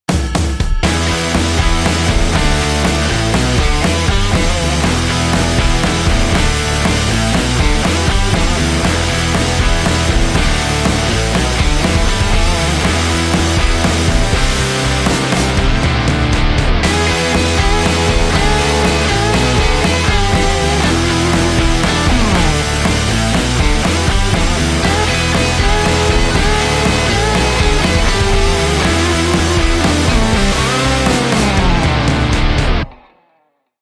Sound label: Guitar Logo Blues; blues intros